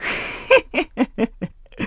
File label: Snicker; Purlo (STAR Game owner) snickering